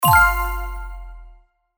success Sound; Puzzles; Jungle

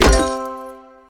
Thud; MQ2

